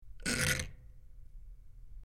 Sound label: Metal Dial Rusty Metal, switch clicks